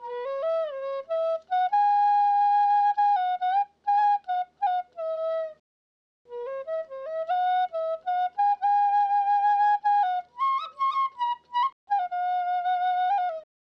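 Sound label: Hip Hop Native American Flute E major 140 BPM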